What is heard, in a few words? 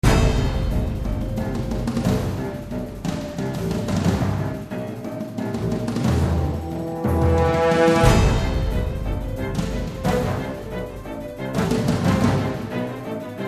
Action